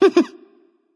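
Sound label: heh heh, female; smite; voicepack; hera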